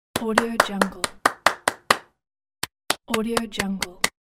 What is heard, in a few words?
clapping